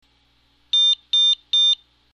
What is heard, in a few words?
pipipi